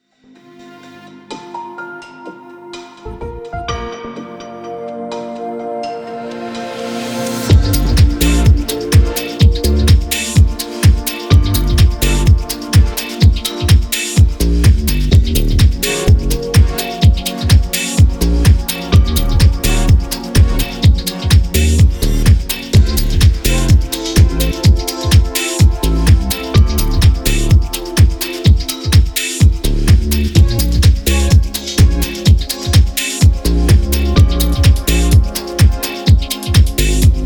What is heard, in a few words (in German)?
Ringtone Menschlich; OEBB Ringtone 5